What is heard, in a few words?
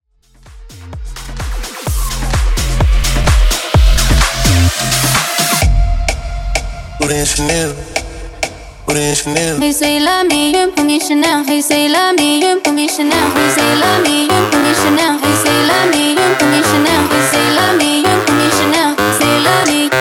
Epic Mashup 128Bpm